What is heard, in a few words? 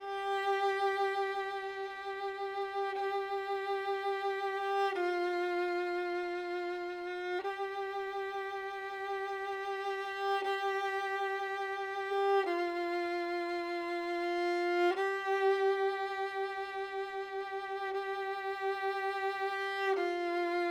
violin